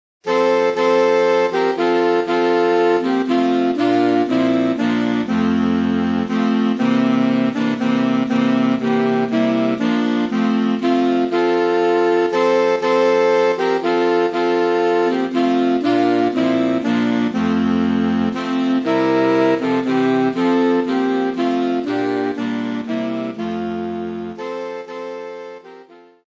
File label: away in a manger sax